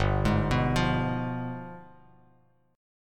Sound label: G 7th Flat 9th chord, Bass Standard; arpeggiated; JGuitar